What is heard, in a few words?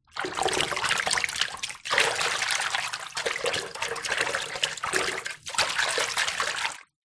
bathroom basin water shallow movements; zapsplat